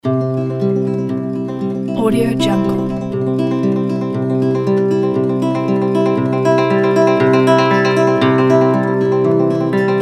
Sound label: classic guitar